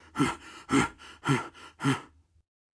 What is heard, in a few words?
Guard Start Run Stumble Breathless; Subway Surfers City; Android, iOS